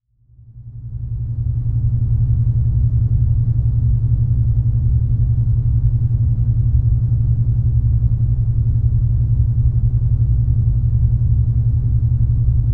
Binaural Beats Orange Noise 11Hz Alpha Wave; binaural beats 11hz with orange noise

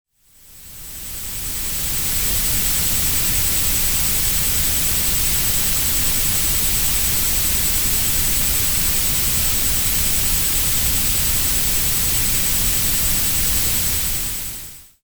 binaural; tinnitus binaural reset

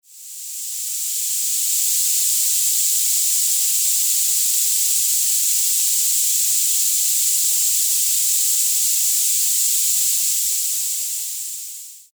7500 Hz Noise Masking; Tinnitus Remediator

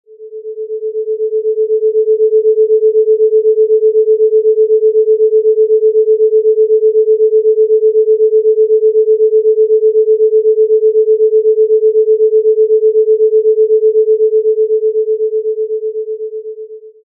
Binaural Beats 8Hz Alpha Wave Range

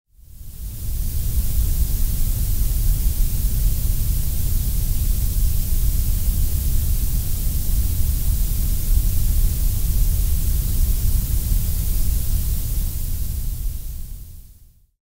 grape noise

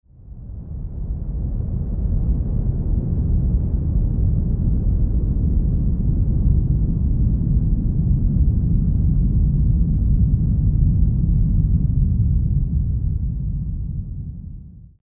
Sweeping Brown and Orange Noise

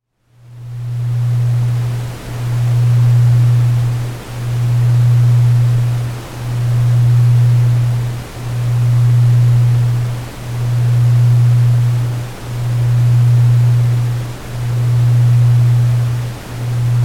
Pink Noise Binaural Beats Delta Wave Half Hz

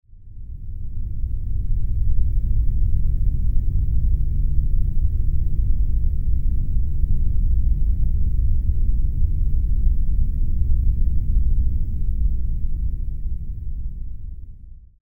Rumble Noise Soundscape, low frequency noise; Rumble Works V1

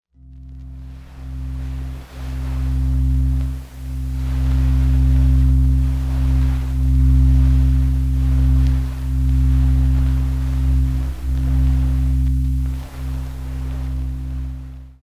auroral noise

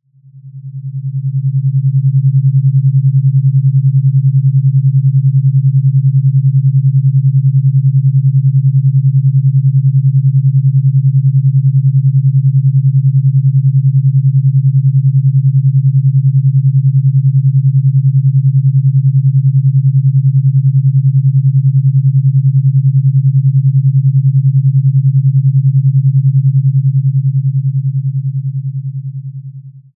Binaural Beats 10Hz Alpha Wave

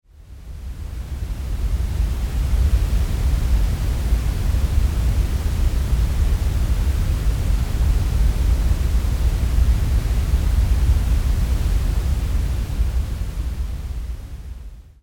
heavy bass pink noise

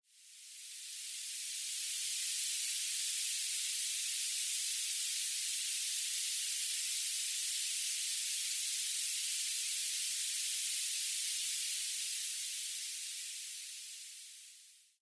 20 kHz Noise Masking; Tinnitus Topper

